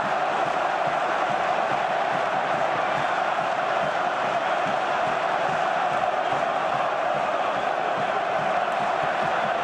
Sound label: crowd; ysoccer